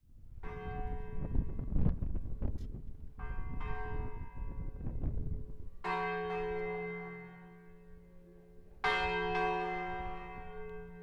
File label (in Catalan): campana